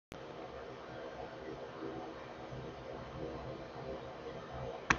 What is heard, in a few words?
full muffle low, 3dprinter fan noise